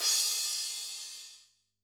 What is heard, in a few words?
Crash, drum